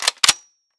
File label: mac10 mag in